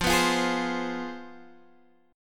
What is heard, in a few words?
C Major 7th Suspended 4th Sharp 5th, guitar